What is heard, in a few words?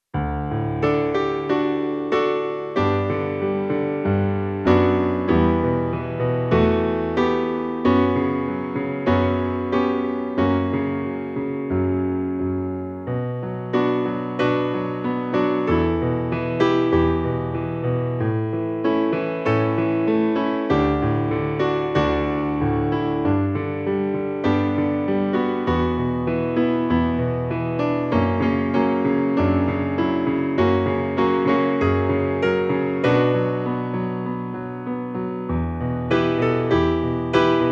Piano